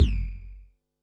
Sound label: Bassdrum, Pearl SC-40